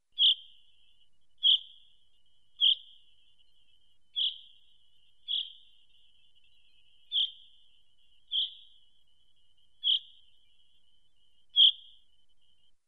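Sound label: zyablik voice, chaffinch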